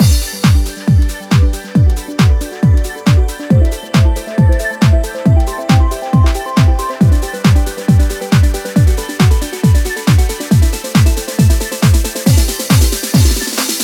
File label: Piano Cover